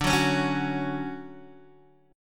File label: D Major 7th, D, down, Guitar DADGAD